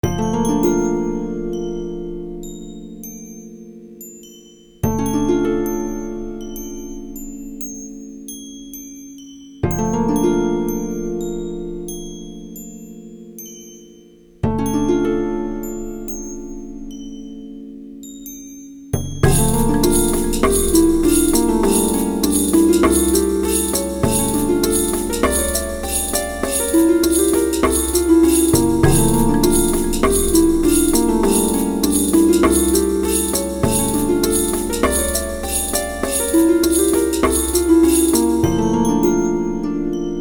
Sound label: minute in forest